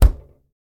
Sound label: opening fridge door